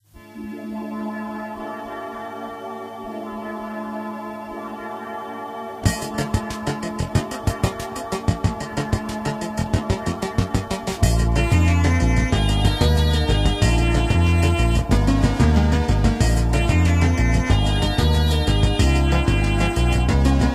girl jazz

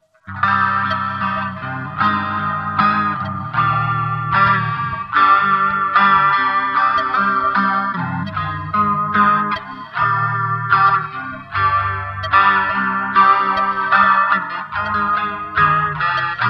instrumental

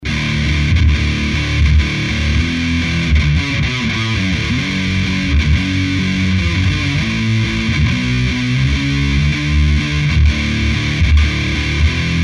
riff

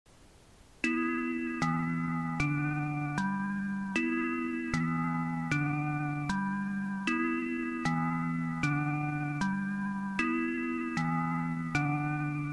synth

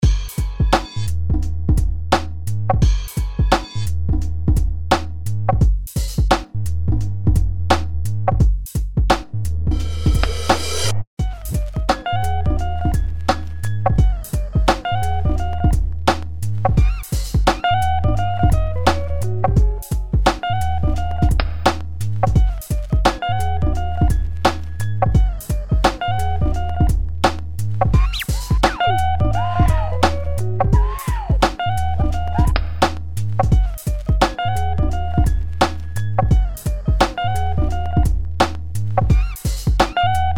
beat

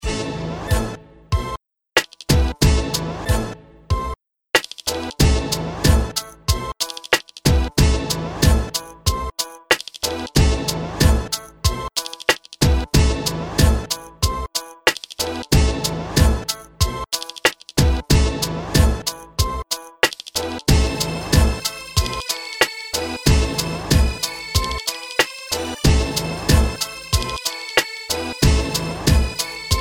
beat battle

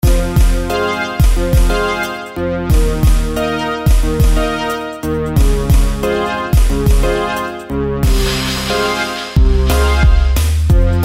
beat voix

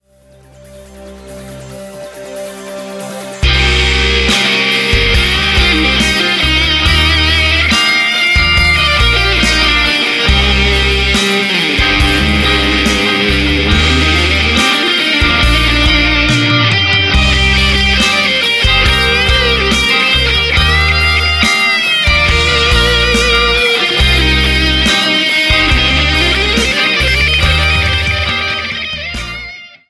solo

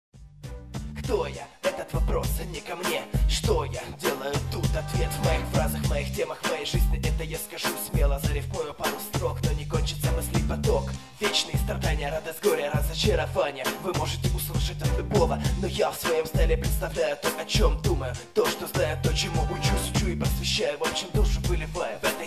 battle rap

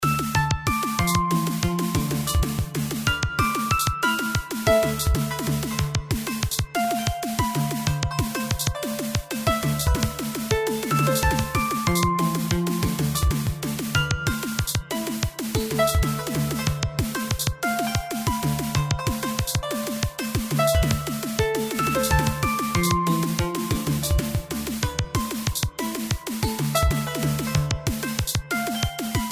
trance

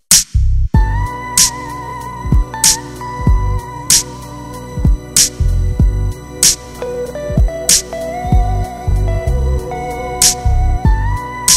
instrumental

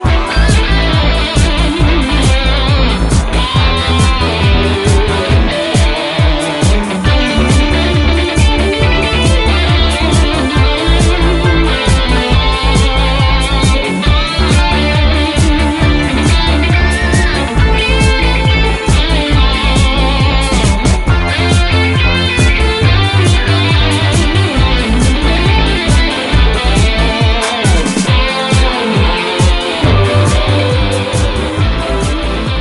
disco

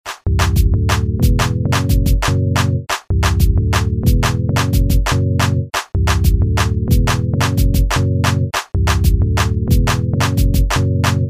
instrumental

